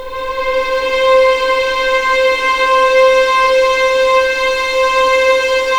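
C5 legato violin, left, The Ultimate String Collection; East West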